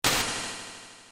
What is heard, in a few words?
hit echo; ff3; ff3us